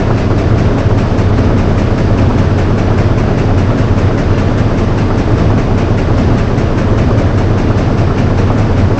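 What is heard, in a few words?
ambience industrial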